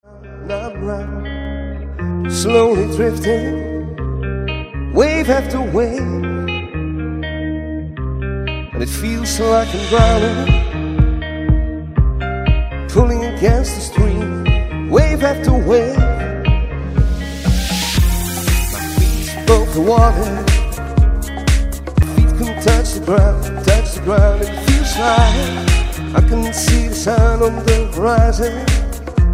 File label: Waves LIVE